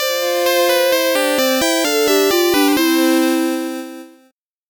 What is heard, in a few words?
8bit jingle fast